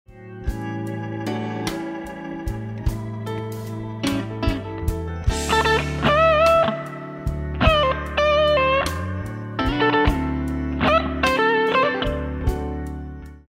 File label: Blues Lick, guitar; Blues Guitar Method 1